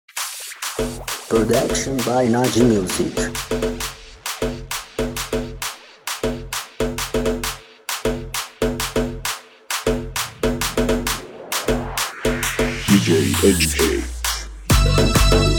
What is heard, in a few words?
tribal